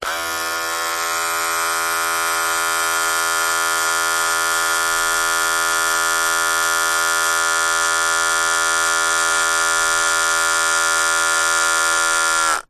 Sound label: household hair clippers electric on run off short